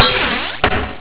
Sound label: clunk